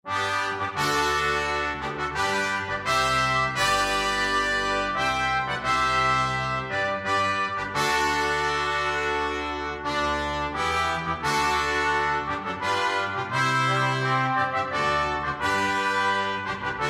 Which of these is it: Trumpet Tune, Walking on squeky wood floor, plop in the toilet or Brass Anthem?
Brass Anthem